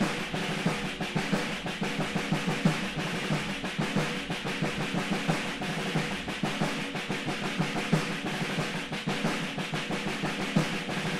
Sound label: Millitary Snares